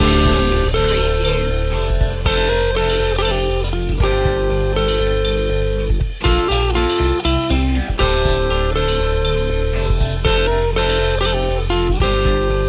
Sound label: acoustic uptempo travel song loop